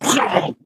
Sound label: ballbot pain hard surface